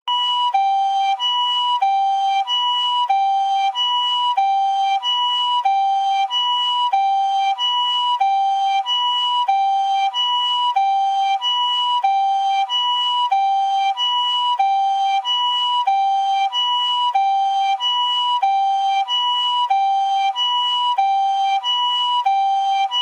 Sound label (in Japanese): 救急車 サイレン音; 効果音; ジングル